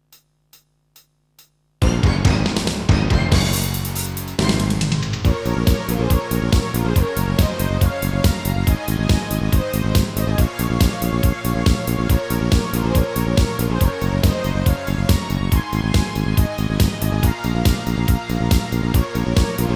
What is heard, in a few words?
Synthesizer Version; Super Famicom Magazine Vol.10; Shinya Hashimoto